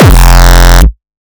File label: TECHNO EXTREME FULL KICK; TECHNO PARADISE